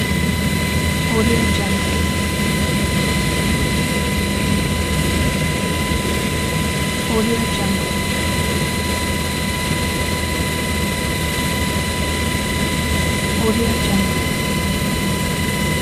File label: military helicopter interior during flight